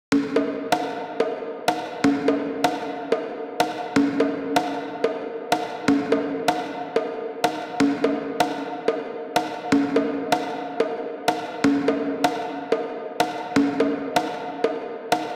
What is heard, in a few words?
Conga Hall